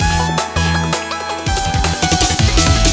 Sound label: Transition; sky rogue